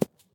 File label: shell 39mm grass; STALKER; IWP